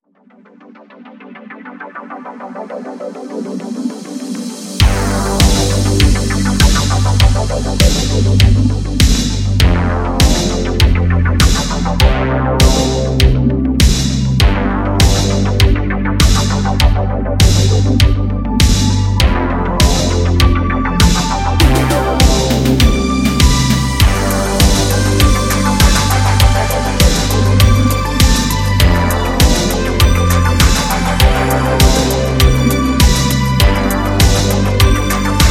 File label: Retrowave, Synthwave background music